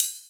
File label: Ride; Crash - Ride; Equipped Music; Loungin House